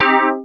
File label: dx7 Synths